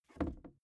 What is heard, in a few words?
briefcase plastic creaky inside pocket; industrie wirtschaft; bueroarbeit